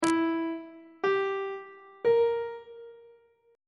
e sharp ionian chord 7 is d double sharp diminished on treble clef